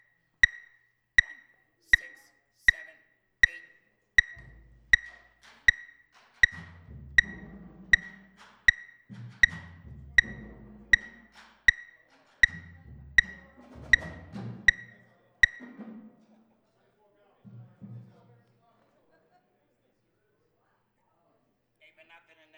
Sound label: Drum Solo